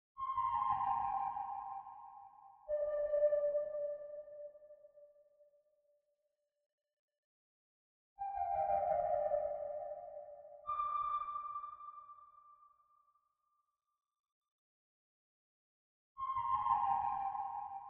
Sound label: 120 BPM G Min Texture; Cymatics; 2022 Melody Mystery Expansion; Unfamiliar